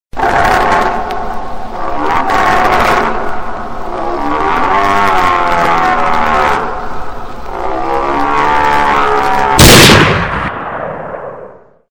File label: deer